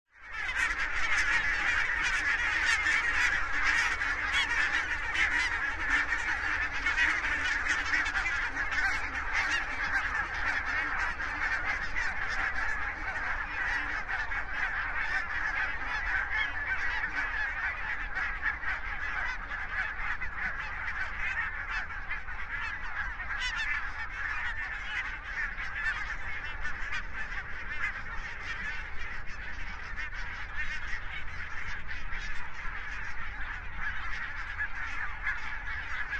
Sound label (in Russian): beloschekaya kazarka golos